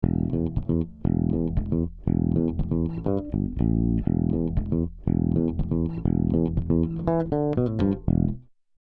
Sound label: Rupert Neve Designs 5254 Bass Fast Parallel; 8 zu 1; GR 15 dB; Parallel 50 Prozent